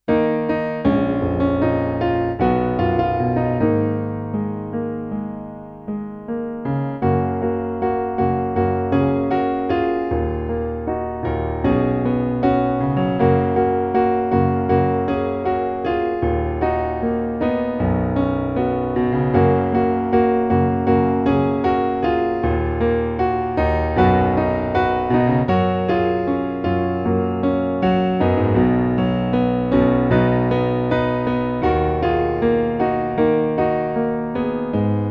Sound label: winter piano playback refrain